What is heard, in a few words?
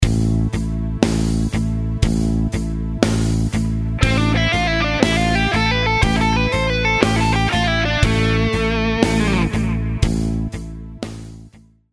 Lick 60bpm